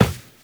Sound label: Run Glass, Footsteps; Warframe; Tenno